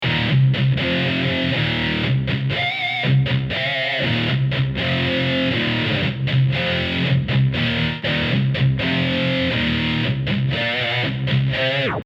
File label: electric guitar; Ηλεκτρική Κιθάρα